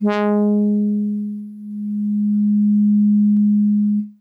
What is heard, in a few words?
Soft Horns DX100 Gs3